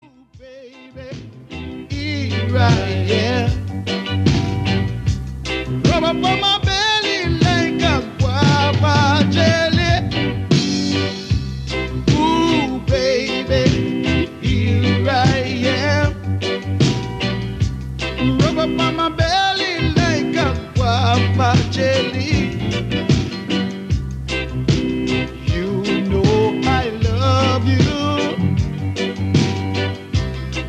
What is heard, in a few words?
Medley